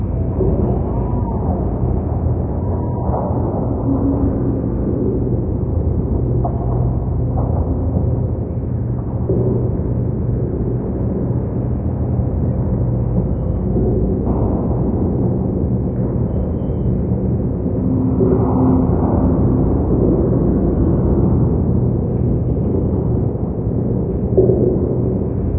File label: amb industrial muffled lp; video games; ambient